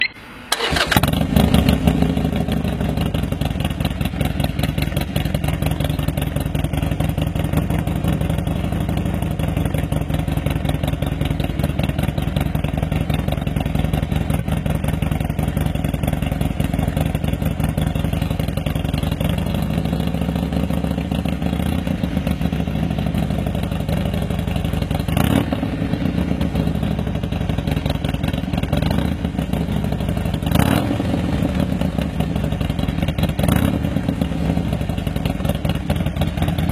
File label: Arrow Mohican Sportster Sound; échappements; motos